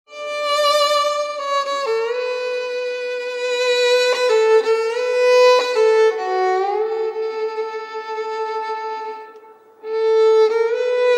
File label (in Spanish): Instr musicales violin guitarra clavecin organo tubos